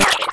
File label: gurp; quake2; gumby